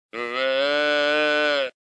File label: Lost Fawn, predator calls